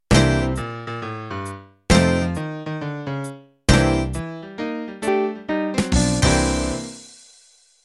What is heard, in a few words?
Country Shuffle End